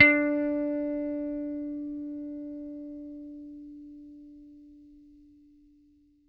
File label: PICK D4, Bass; Good 80 Pick; Bass Mace; DirectWave